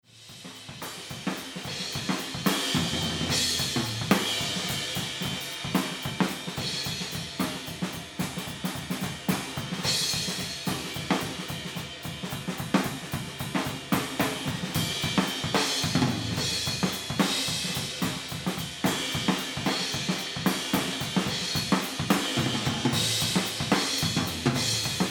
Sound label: Drums Room EQ On